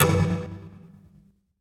Impossible-Triangle Pizz; viewfinder

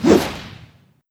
Head Woosh; Legend of Keepers

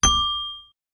Bell puzzle

